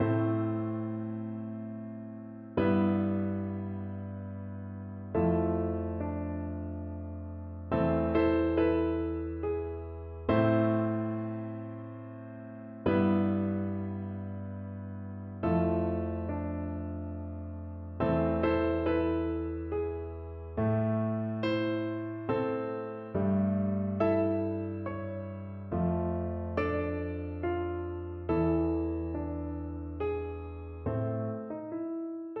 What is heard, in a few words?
horn, French Horn, chaconne